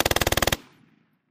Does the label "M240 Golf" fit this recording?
Yes